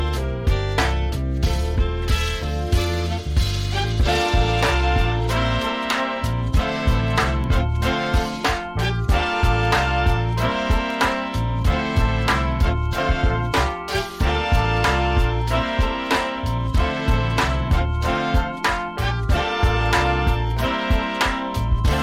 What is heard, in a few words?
Accordion